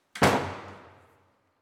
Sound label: Bang